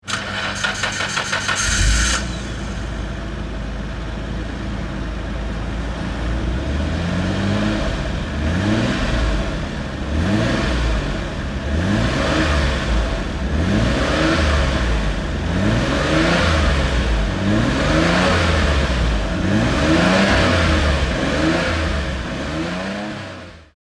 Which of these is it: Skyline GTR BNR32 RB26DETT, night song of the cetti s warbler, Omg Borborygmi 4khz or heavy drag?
Skyline GTR BNR32 RB26DETT